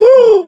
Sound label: woo